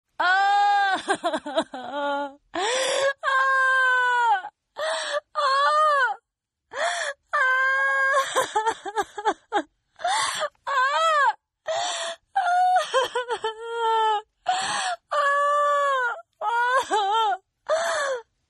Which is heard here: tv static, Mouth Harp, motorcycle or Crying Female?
Crying Female